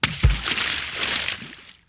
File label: dump